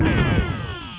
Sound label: Gun Shot; Blake Stone